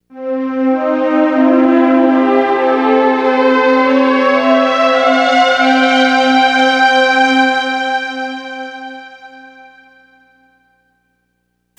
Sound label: Walker I, modes